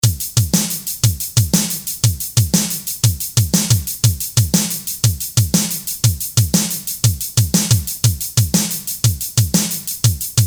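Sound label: Congrats triplets; Akai MPC1000; Flash memory demos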